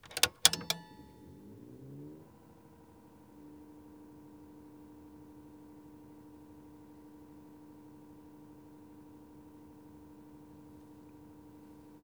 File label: Gear Foley, Reel to Reel Power Up; FX; Cymatics; Eternity Sample Pack